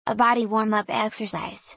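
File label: body warm up exercise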